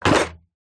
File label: industrial tool box large wooden full of tools drop down